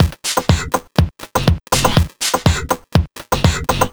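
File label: 122 Random Phunka No Snare, Drum Loops; 122bpm; Nu Dance Electro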